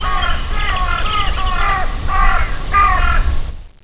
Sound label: corvus